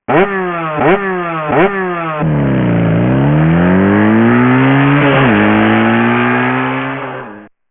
Motorcycle sound

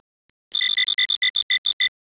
Frog sound

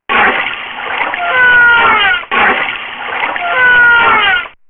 whale sound

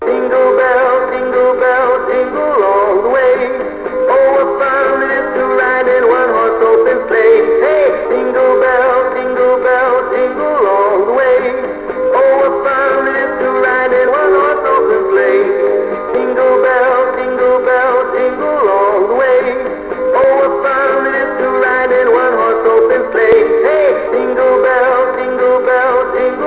Jingle bell, Human singing song

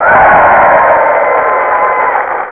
cheep up

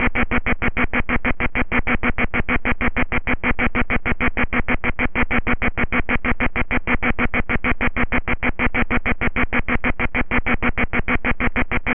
Cicadas

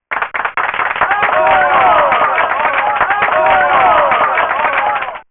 clap hand applause sound

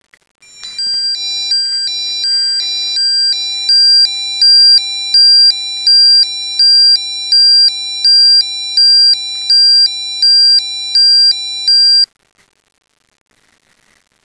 Ambulance siren

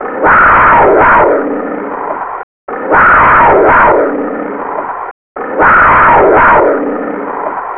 Lion

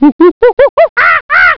Orangoutang

